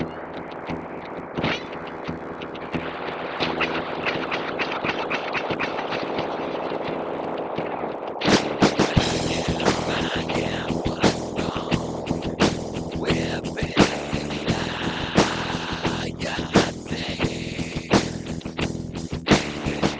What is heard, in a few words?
children percussive